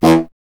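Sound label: wind contrabassoon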